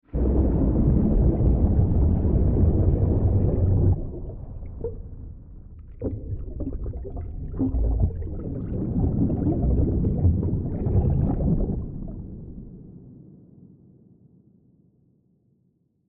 Cinematic underwater texture

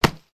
Catch catchers gloves

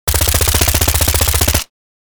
Sterling submachine gun short burst; war

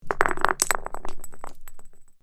Grenade roll on hard surface; gfxsounds

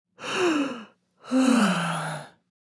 Woman yawn

